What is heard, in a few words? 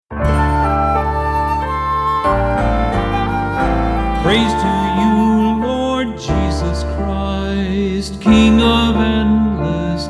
Lenten Gospel Acclamation